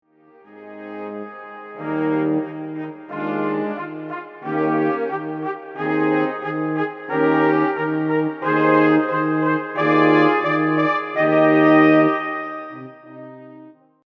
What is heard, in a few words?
brass